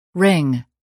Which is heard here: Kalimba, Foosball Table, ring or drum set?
ring